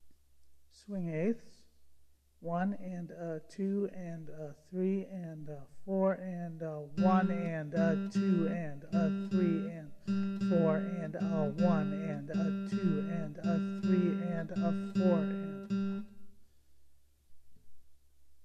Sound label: SWING, guitar